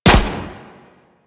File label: CY Xbow 2D Shot C; Tenno